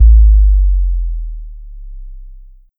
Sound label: Kick; Uzerx; pitchglitch samples